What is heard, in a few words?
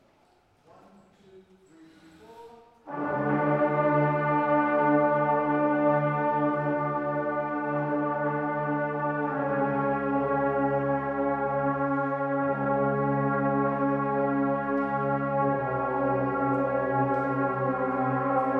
Warm Down, brass; north star brass; woburn ma